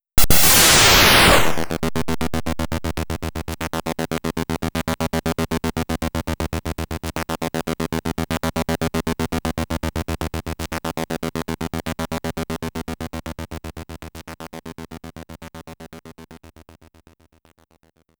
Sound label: Pinball Action - Main BGM; Pinball Action PURPLE